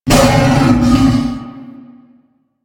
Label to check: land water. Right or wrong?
Wrong